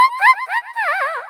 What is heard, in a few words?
cutie mew mew